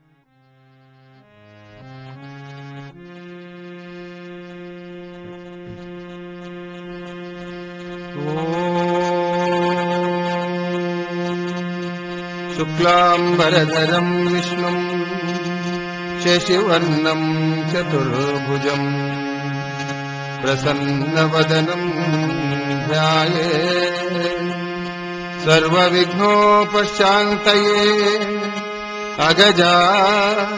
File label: opening chant; Bhajan Bhakti